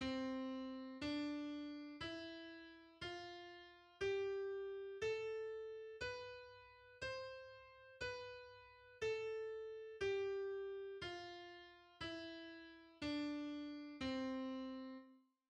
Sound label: C major scale